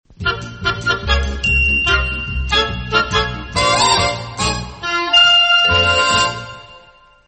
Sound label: short instrumental intro; australian otr promos and idents